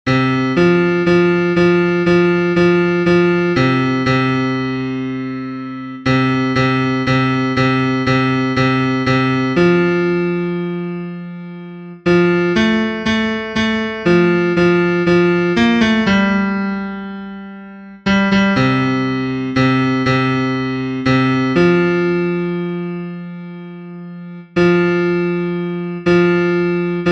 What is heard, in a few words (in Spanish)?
BAJO, himnos en piano; cantos del camino